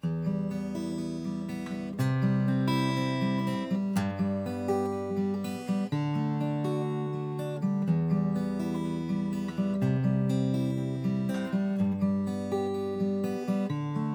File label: Single Take Fingerstyle; Filter